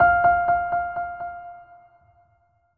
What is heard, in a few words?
piano F6 8va delay